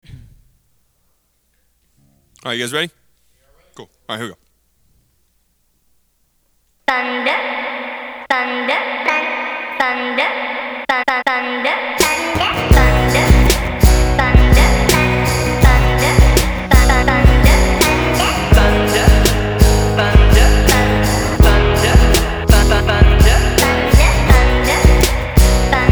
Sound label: live acoustic